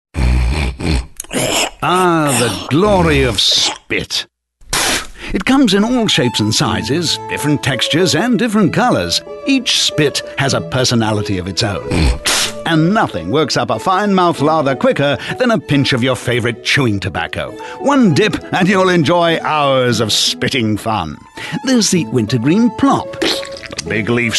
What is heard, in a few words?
spit